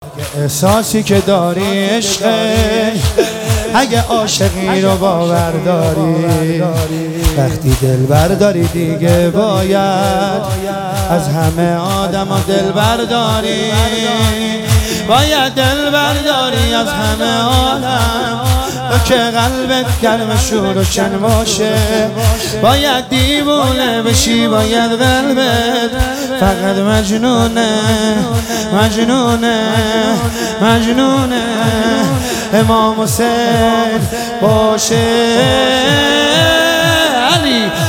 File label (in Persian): مداحی احساسی; کربلا